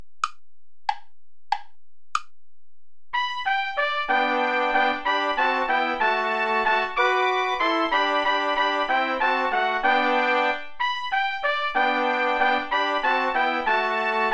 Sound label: play along without trombone; asikhatali; traditional